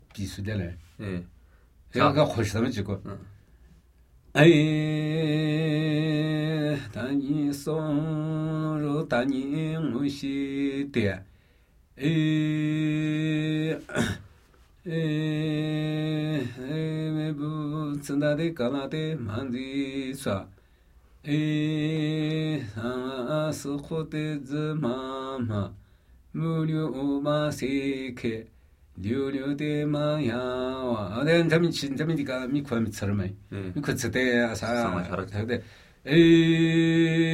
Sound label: Namuyi Tibetan Ritual Chanting